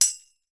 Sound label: ALUMINUM, TAMBOURINES; Voice Crystal - Steve Reid Definitive Percussion